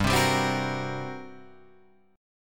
G Minor Major 7th Double Flat 5th, G, Guitar, Open G